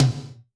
Tom, drum

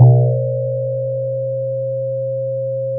pop, Sound of a church bell

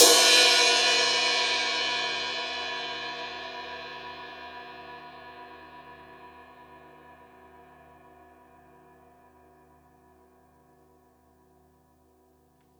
Crash, drum

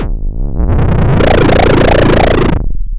pop, chainsaw

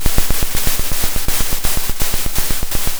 pop, Sound of a drum roll

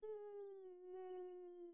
fear low; VIVAE; vocaltrax

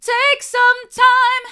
take some time hard, vocal

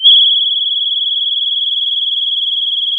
pop, sound of a tea kettle whistling

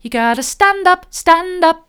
you've gotta stand up mid, vocal

